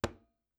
conga mute

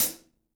closed hat hard, gretsch; Dirt-Samples

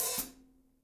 foot hat 2, gretsch; Dirt-Samples

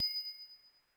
tink

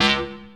stab; Dirt-Samples

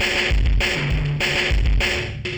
ind dist

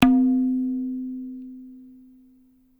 tabla; Dirt-Samples

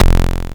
ship

